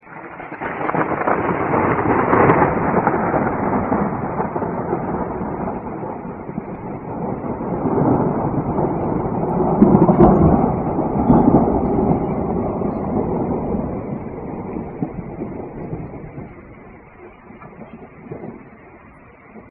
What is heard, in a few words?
thunder